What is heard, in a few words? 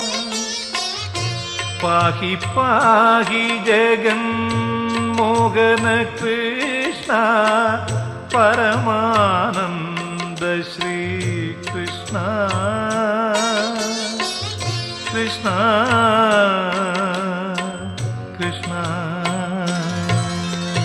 bhajan krishna